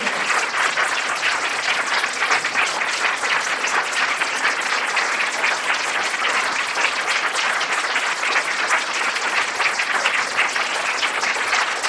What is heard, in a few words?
applause